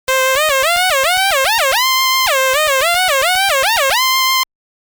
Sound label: Acid Lead